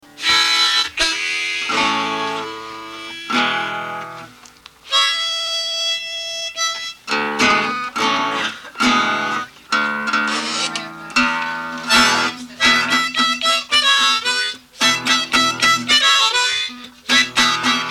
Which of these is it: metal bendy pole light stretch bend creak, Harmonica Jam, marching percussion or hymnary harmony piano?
Harmonica Jam